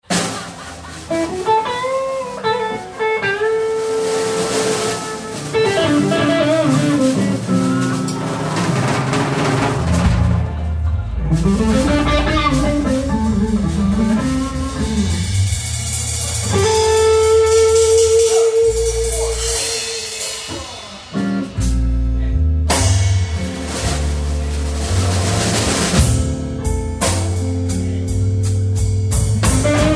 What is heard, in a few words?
Blues